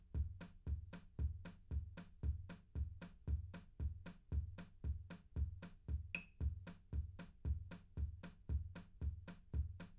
wdrums liverec; SiSEC11; underdetermined

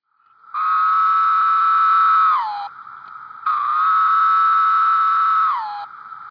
cicada

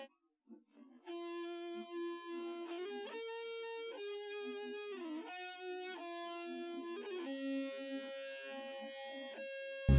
nodrums inst sim; SASSEC07

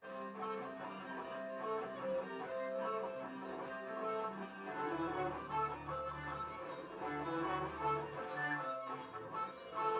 nodrums; SiSEC10; underdetermined

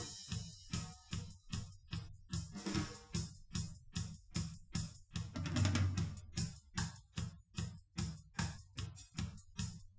wdrums liverec 250ms 5cm sim; SiSEC08; SiSEC underdetermined

